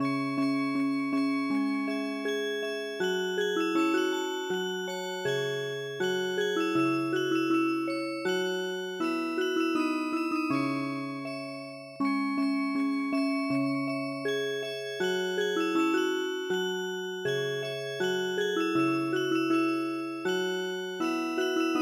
Music Box

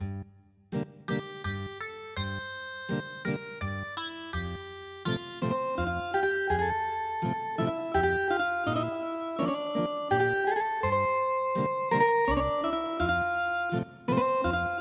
Ethnic Guitar

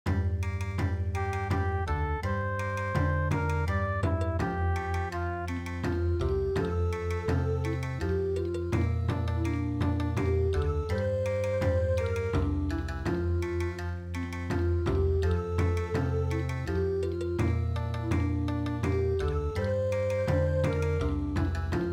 Swiss Bon Odori; traditional

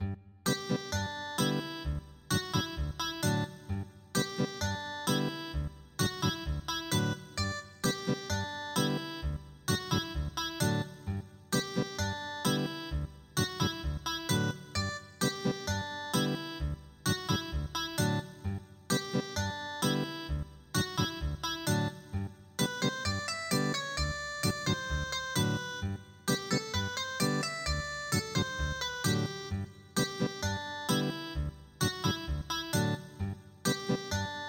Ethnic Guitar, traditional

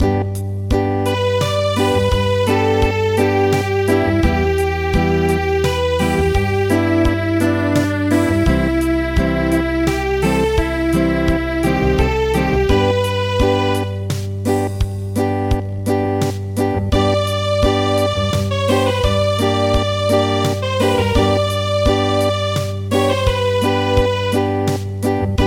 Acoustic, traditional

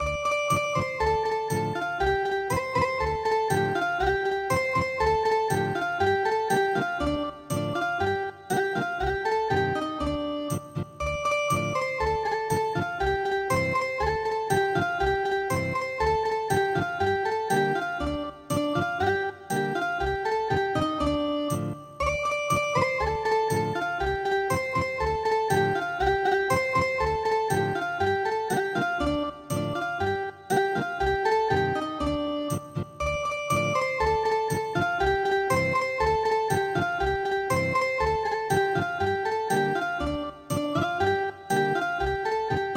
miyasan Ethnic Guitar

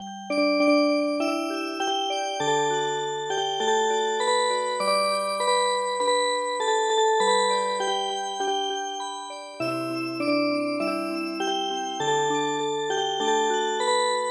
Music Box, midi

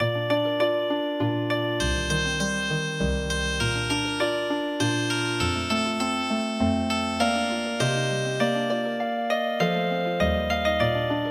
Healing Harp, traditional

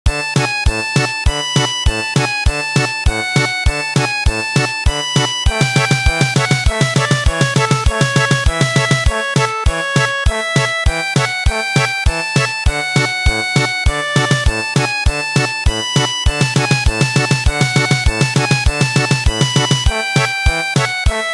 Oberek German Polka

